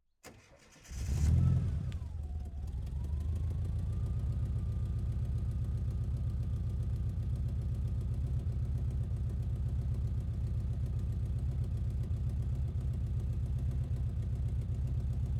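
Volkswagen Van Exterior Start Idle Blips Steady in Neutral Off; 1978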